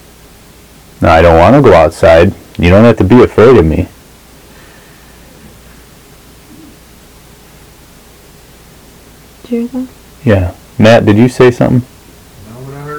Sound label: Live EVP Recording Unexplained Very low giggle AMPLIFIED